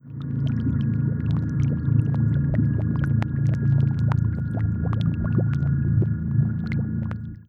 ambient bubbling liquid

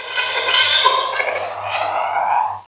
alien cry; ambience

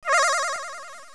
load

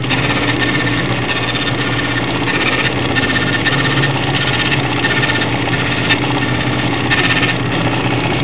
computer printer

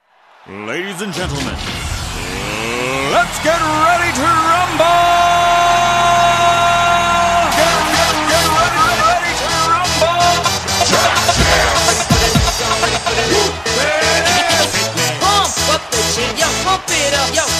rumble; moto

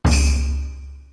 hat sound

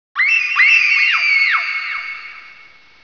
f scream; ambience; rebar

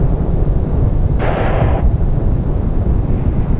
engine; rebar

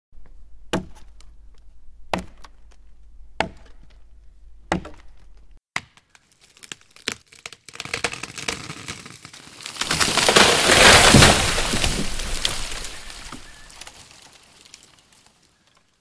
tree fall

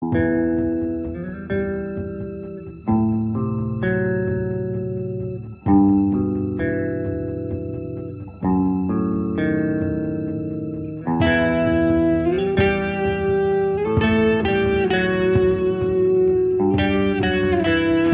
metro

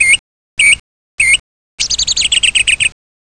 bird

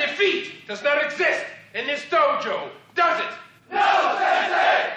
defeat

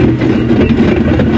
conveyor belt; ambience

